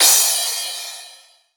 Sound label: Crash, Drums Cymbal